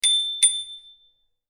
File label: bell